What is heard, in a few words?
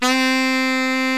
SAX COMBO